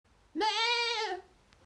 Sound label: Goat